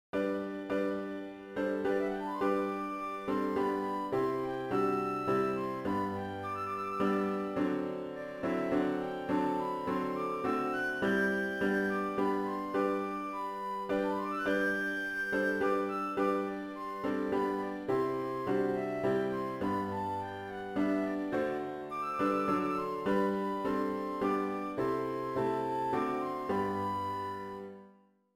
FLUTE

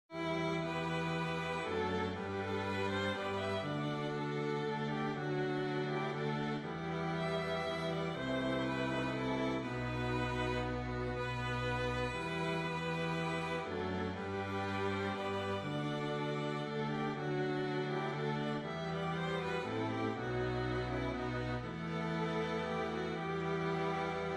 Hymn Strings

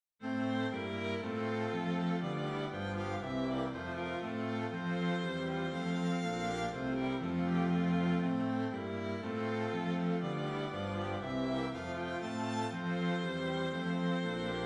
Hymn Strings

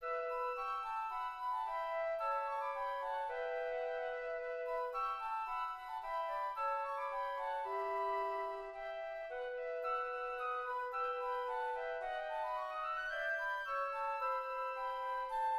Hymn FLUTE

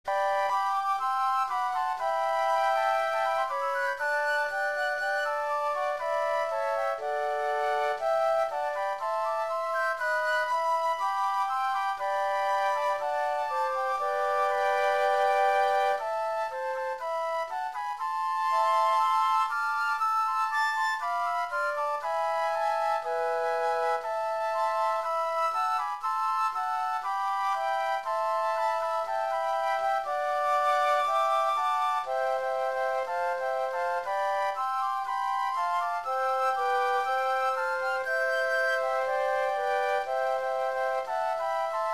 Hymn Flutes

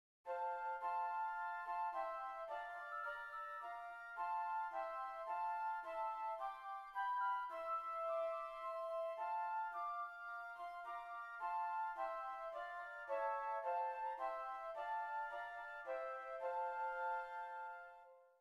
Flutes; Hymn

